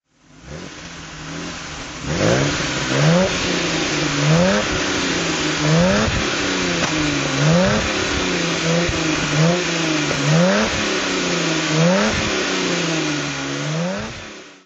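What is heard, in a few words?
mercedes benz 280sl r107 throttle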